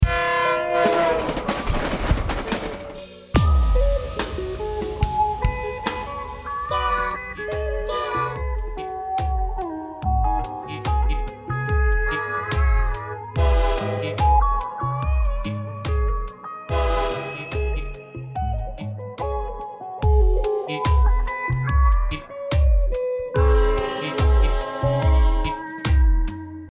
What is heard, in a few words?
slo funk train